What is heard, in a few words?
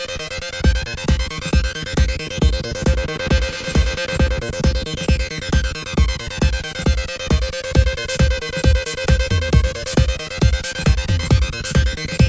Loop; Cannery Vale